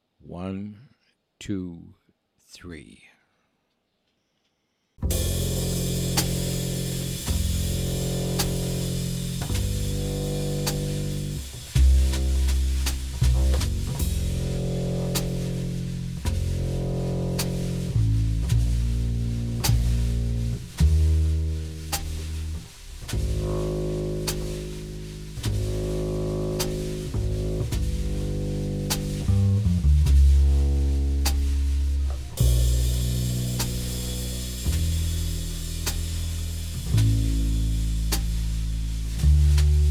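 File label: bass drums only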